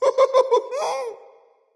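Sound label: "heh heh", nonverbal; smite; voicepack; khepri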